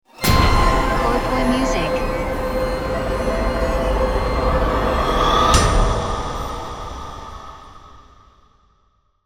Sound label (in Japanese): jingle ウォーター